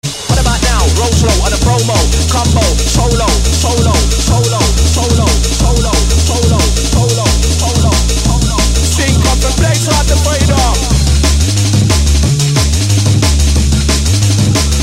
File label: Drum And Bass